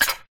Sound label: Stop Turning